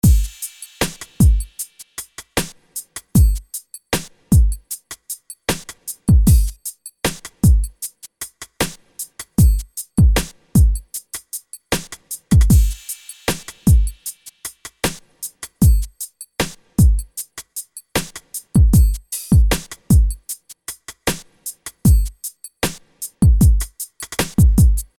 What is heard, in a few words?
Drums Original